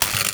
electrocuted spark

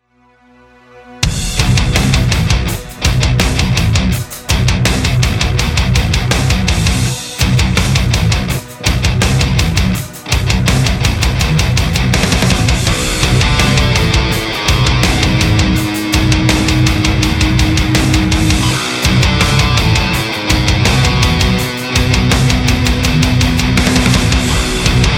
bomb planted, music

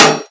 metal computer impact bullet; physics

